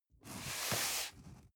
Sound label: cardboard box medium slide short soft; objekte